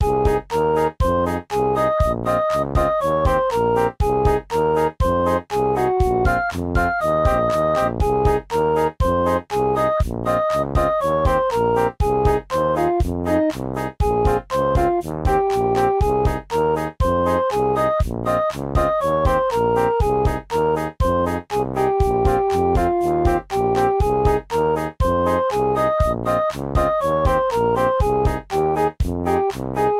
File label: BGM Sport; Yuru Yuru Gekijou Gekijouban 2 3